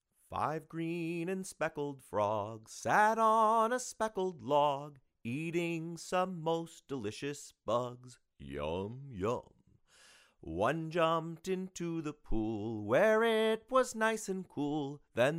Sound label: 5 green and speckled frogs